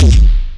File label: bottomless; Pro-Rec - Synthsonic